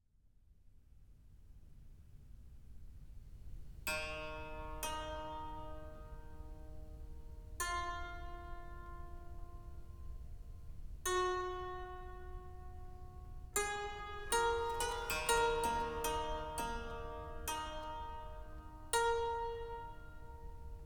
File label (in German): Orgelbuch; Redeuntes In Re; Buxheimer Orgelbuch